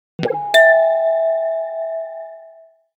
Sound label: like a door bell, ringtones; standard ringtones